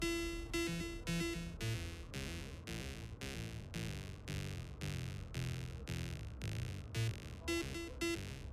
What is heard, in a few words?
Menu Loop; Wall Jump Ninja; Atari 8-bit